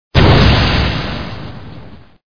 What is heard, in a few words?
SFX sound (explode sound)